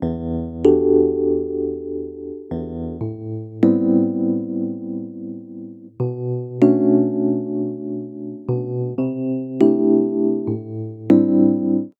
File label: Pop, Pop Rock; 080; Pop MarkII; Pro Tools Essential Demos; Digidesign; Essential Loop Demos; Demo Session - Pop Rock